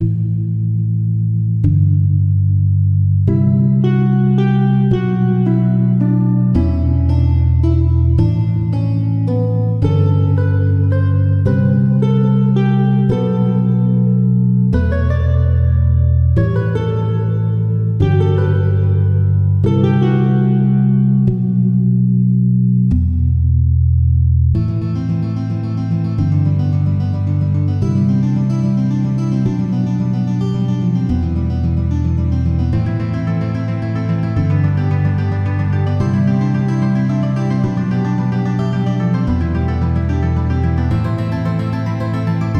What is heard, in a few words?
meditation, chiptunes